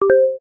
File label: hit, keno; games; Bingo